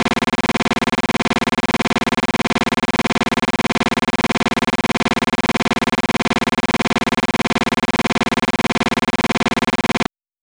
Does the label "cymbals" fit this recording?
No